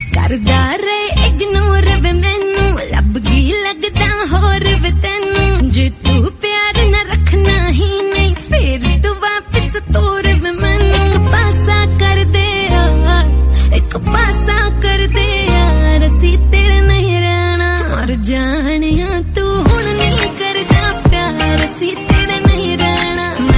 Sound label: hindi ringtone, bollywood ringtones